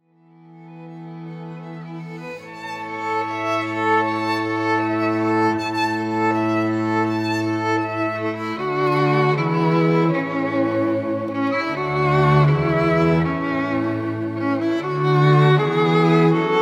strings; classical musicians

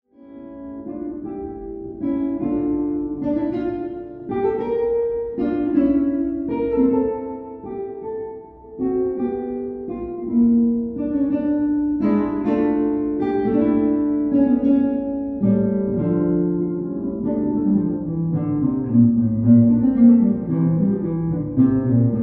classical guitarist; classical musicians

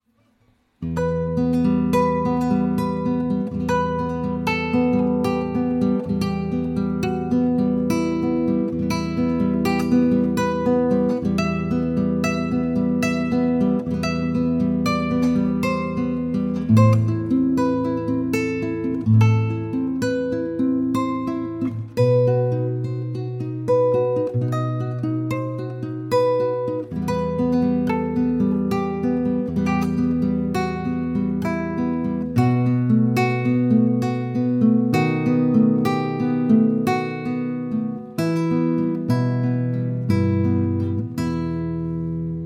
romanza traditional spanish

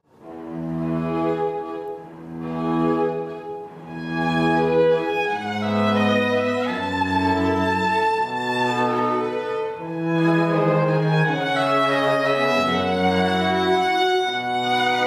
strings

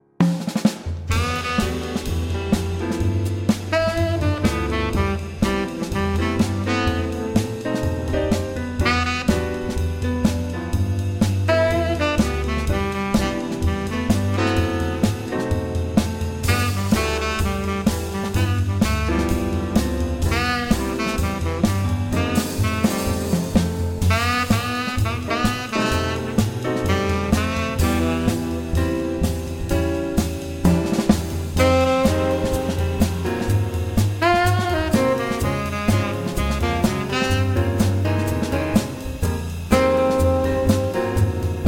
swing; jazz swing

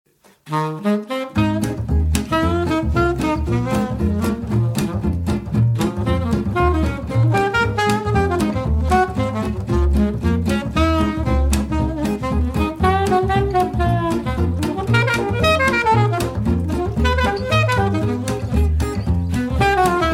jazz

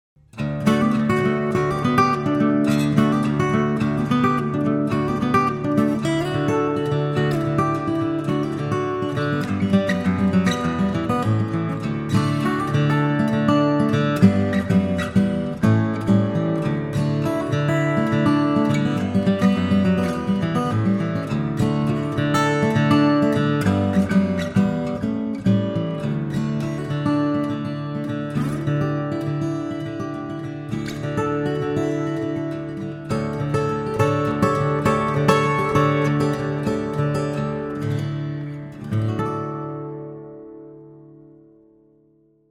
guitarist

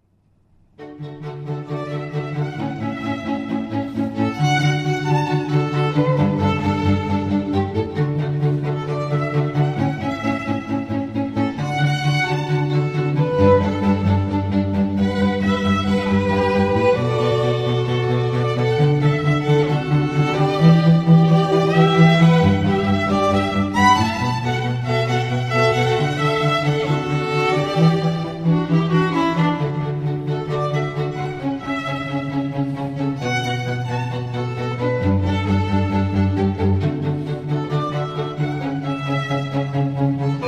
strings trio

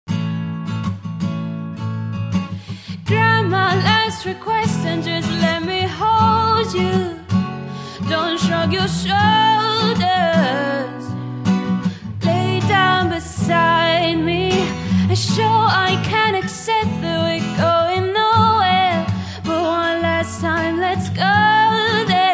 acoustic

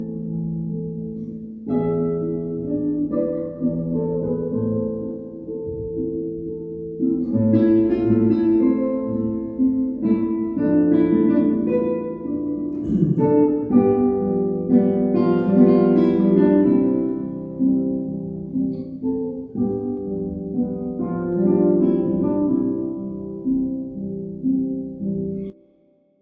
classical guitarist; classical musicians

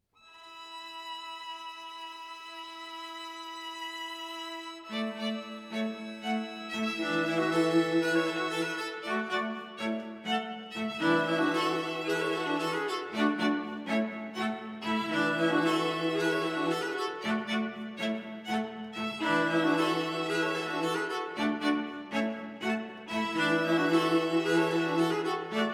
quartet

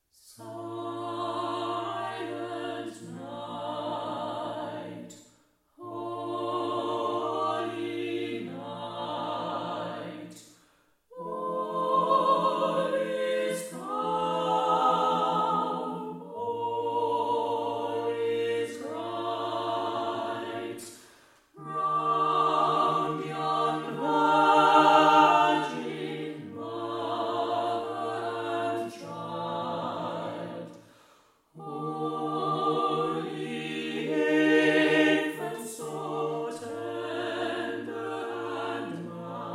voice

